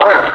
pain, suppressor

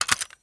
contents, ammo clip rapidfire

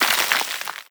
debris dirt, bullet impact debris

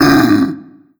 pain, barnacle; Half Life Alyx

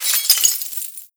debris glass, bullet impact debris

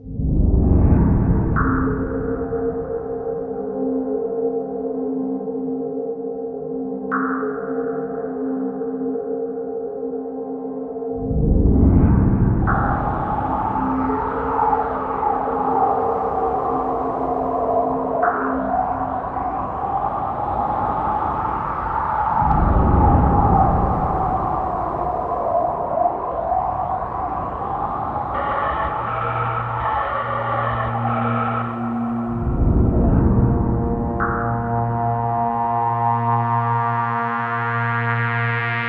tower puzzle, music; c17

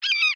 hawk

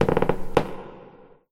plank creak; scripted sequences

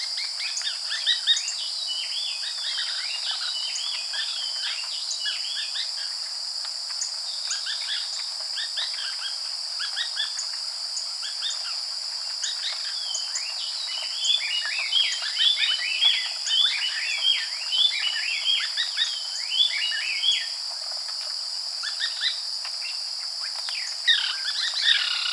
jungle exterior

